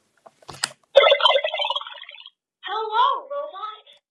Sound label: robot booting